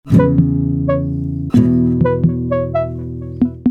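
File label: Ringtone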